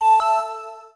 New Mail; MSIE 4.5; Microsoft Internet 4.5; Outlook Express

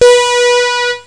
tone

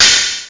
cymbal china; Amiga Special - Software Hits; orchestra; drum

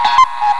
Cuckoo; Mac Expert - Winter 95; WatchWork 1.0

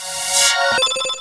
Going Up; PPPop

